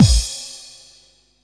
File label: KIT HOU; Best Service Dance Mega Drums